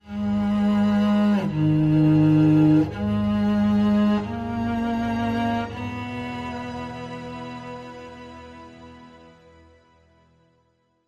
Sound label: Orchestral